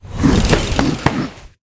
Pet Troll

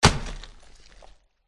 dead pirate boss attack contact middle

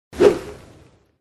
bat dodge

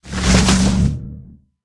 tree attack voice up

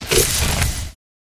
White Bear Block

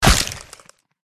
dog attack contact bottom

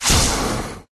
bug attack bottom

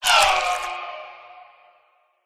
umertvie boss wound

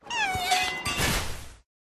Pet Monkey Die; monkey balagur

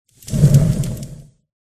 dog upg buff red

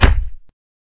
wound punch

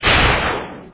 ghost low

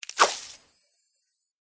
fur tree attack voice bottom

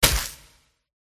attack contact middle

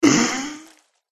zombie wound

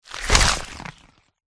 tiger

